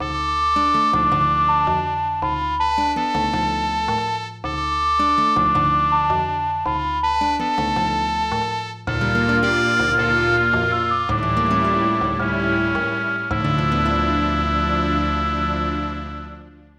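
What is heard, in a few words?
Ocarina; Nintendo 64; Legend of Zelda, The - Majoras Mask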